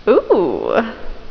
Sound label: "ooh"